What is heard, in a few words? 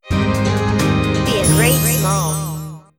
logo